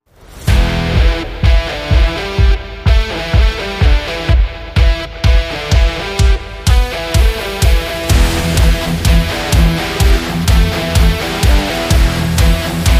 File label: Upbeat Rock